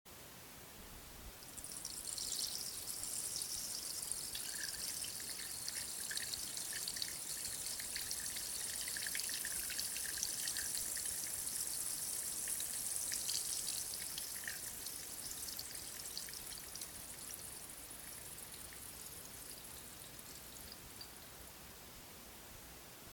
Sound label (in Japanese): しょしょーおしっこ